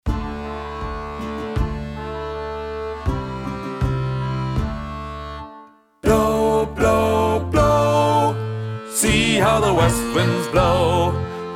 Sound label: Tenor